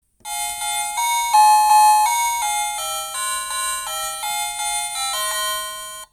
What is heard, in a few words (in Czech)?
melodie